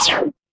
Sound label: retro game laser